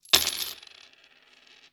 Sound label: coins table